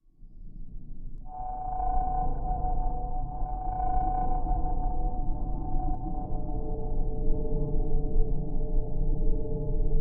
Sparrows Nightmare Haunted Forest Night Frightened Birds Cry